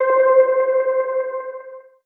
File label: SYNTH (C); Lightspeed; Cymatics; Mystery Pack Vol 4